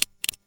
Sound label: empty click